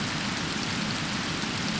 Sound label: Water; Zelda; OOT